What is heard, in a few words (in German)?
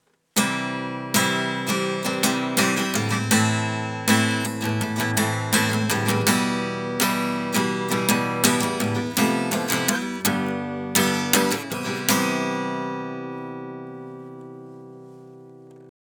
Gitarre, LPF Boost 5 bei 10kHz